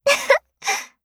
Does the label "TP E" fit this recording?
No